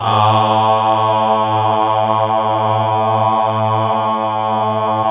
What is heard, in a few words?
GOSP. AAAHH; Sound & Vision - Gigapack II CD 1